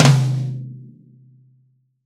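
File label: Reg. Mid Tom, Roland BK-5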